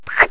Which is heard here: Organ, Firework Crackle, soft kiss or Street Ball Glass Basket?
soft kiss